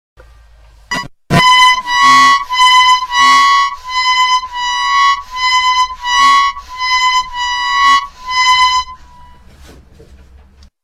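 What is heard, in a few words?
mundharmonika, harmonica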